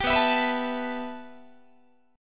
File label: B 7th Suspended 4th Sharp 5th B down Guitar; B7sus4#5; strummed